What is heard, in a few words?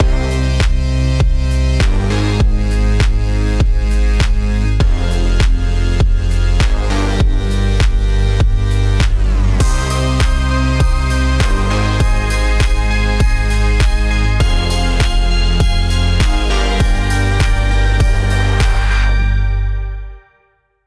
Chords